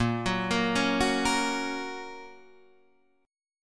A sharp Minor Sharp 5th A sharp down Guitar Half Step Down